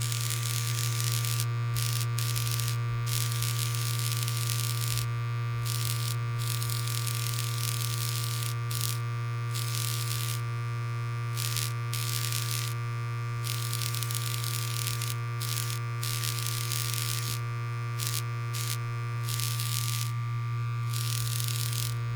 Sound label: electric crackling; triple-aaa-games